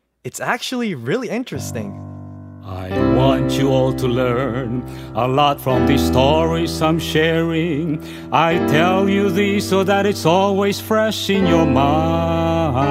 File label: Dialogue Song; Act 2 - Scene 9